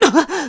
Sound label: Gasp; Link gasping (at Yuga); zelda